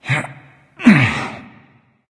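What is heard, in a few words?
nonverbal grunt lift; smite; voicepack; ullr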